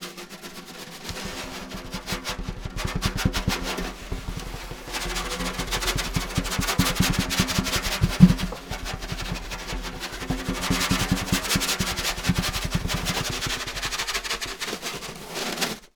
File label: Snare Drum; Percussion Samples